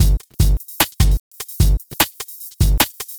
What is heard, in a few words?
Beat, Futurist Drum & Bass; Drums & Percussion